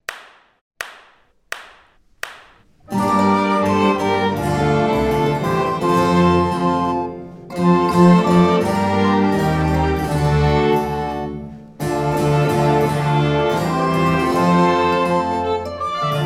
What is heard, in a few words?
orchestra